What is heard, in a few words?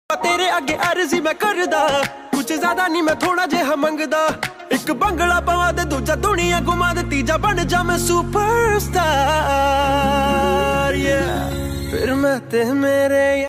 panjabi ringtone